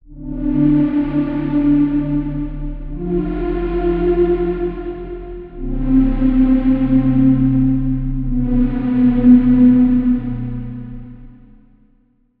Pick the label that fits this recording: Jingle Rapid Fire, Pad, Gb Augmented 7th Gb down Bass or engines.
Pad